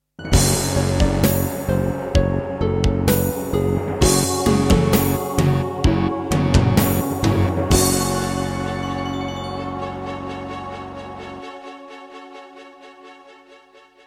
Roland SC-55; sigil doom episode 5

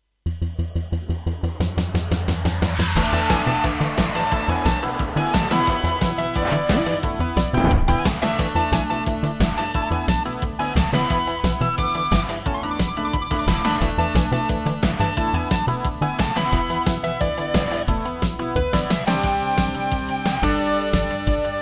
Intro Serdaco MP32L MT32ROM; Willy Beamish

